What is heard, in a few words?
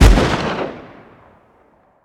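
weapon pistol mag fire player atmo ext; gmod; viper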